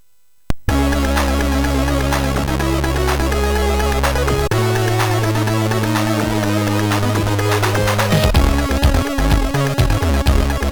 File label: Digi Test, MOS6581R4